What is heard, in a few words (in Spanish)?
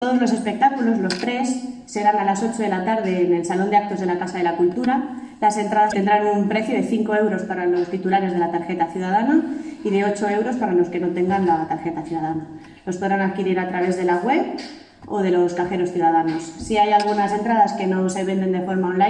flamenco